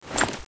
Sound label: gunkata draw, weapons